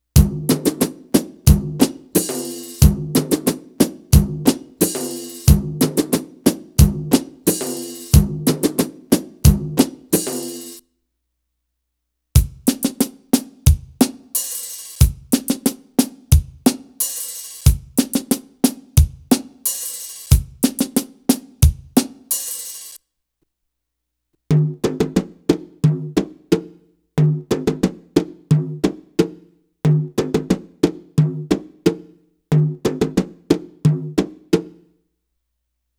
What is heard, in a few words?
Arabic Rhythms